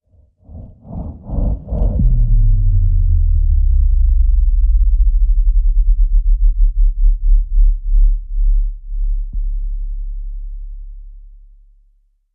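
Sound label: Sub Fltr, sub filter; Stockade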